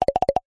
multimedia notification pop message tooltip small double tap